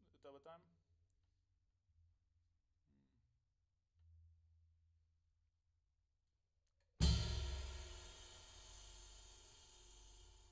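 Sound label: Floor tom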